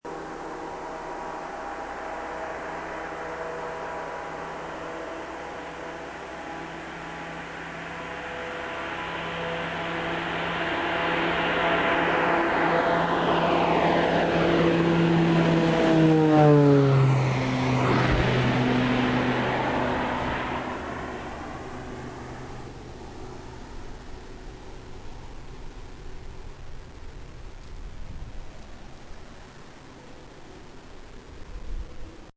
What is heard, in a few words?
Basler take off